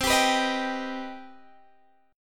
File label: C Minor Major 7th, Guitar, Open G; down; chord shape